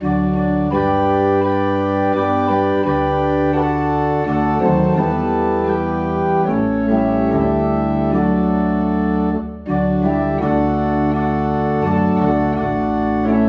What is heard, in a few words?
Organ in D; Children of the heavenly Father